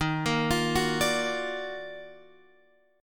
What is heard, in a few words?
Eb Minor Major 9th Guitar Open F; JGuitar